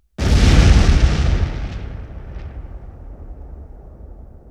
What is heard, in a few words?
Explosion, 5.1 Surround